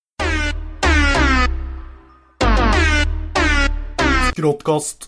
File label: Airhorn